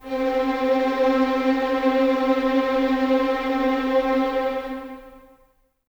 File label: Violins Tremolo C 4; 23 Violins; String Ensembles; Miroslav Vitous